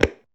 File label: footswitch down; The Swapper